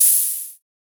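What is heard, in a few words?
GEM15 hi-hat, GEM Drum15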